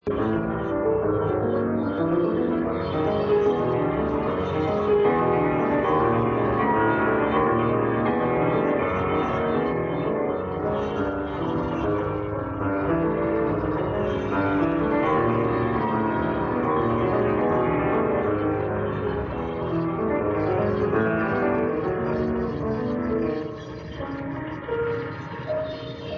Piano Works

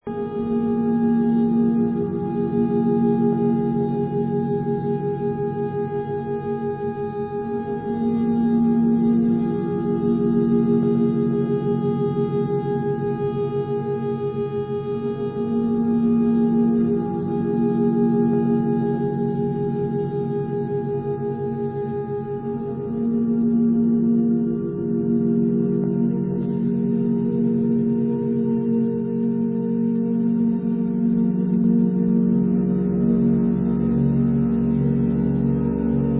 Music for Cello

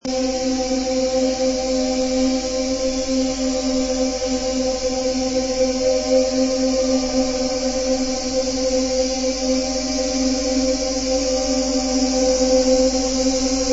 Mountain Cicada

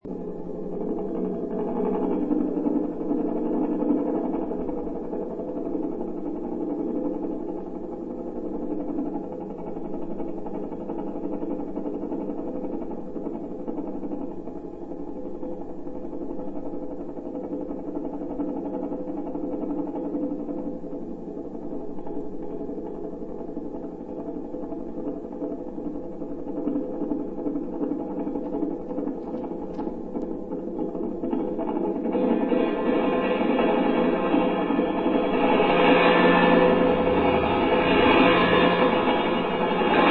Waves

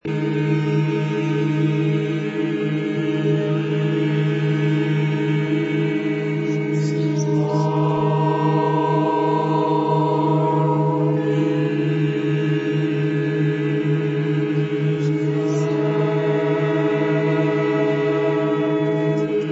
Microtonal

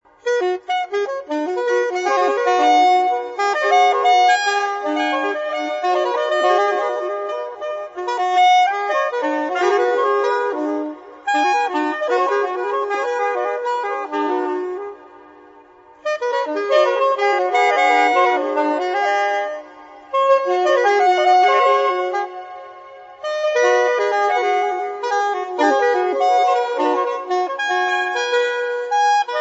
Calm

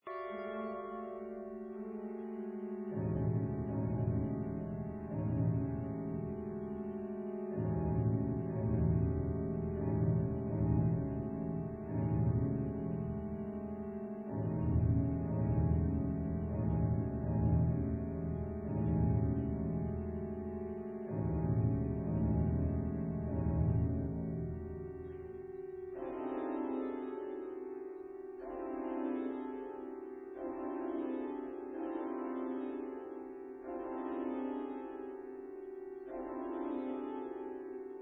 Organ Music

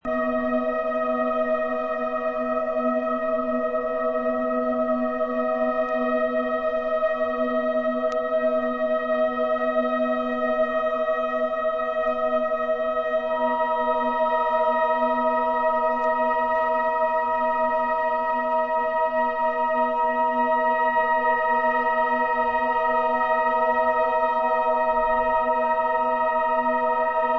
Descending

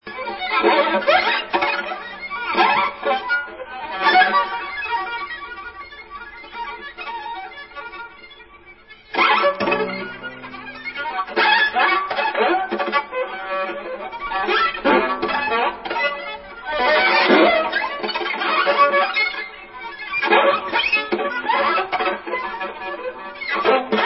Viola A2

